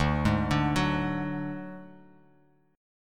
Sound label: D Major Flat 5th D, chord; arpeggiated; JGuitar